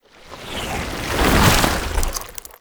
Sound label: Pool Noodle Heavy Attack, Melee